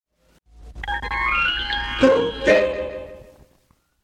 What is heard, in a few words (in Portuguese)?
Vinheta; Super Radio Tupi SP